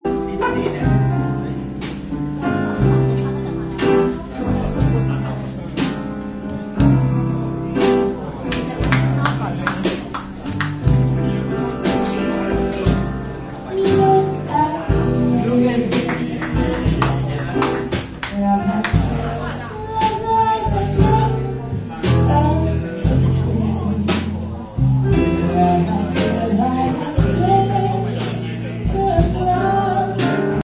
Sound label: jazz; im jazz happens bangkok